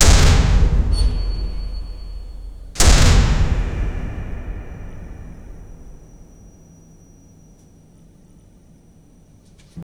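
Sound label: mechanical ambience; pang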